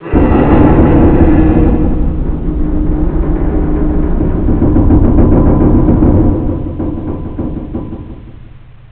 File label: tower rotate mix; Inside Mac Games; Myst Demo; Myst Preview